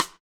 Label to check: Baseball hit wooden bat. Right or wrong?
Wrong